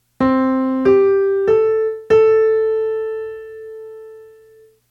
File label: Melody; Hosea